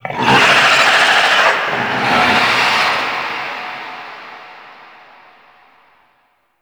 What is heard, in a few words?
Infested Juggernaut Spawn Warning Vocal; Warframe